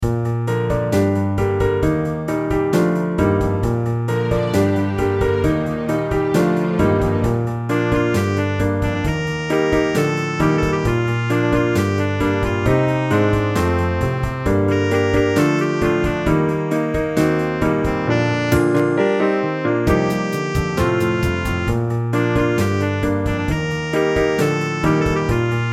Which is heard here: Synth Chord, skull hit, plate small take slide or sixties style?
sixties style